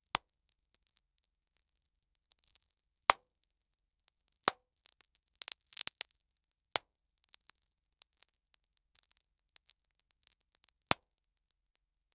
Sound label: confetti popper